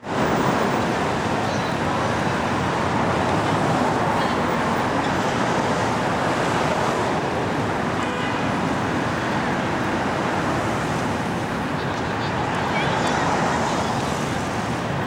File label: traffic